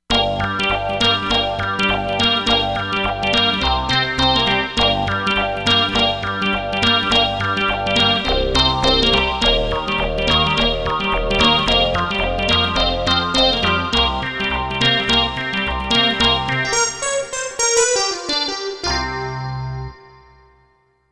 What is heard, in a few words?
Binger, Korg MSC-07S Synth; Korg MSC-07S Synth 2